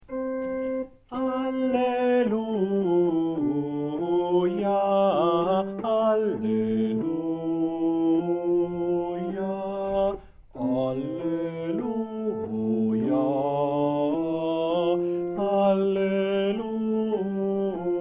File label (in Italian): Bassi; Alleluia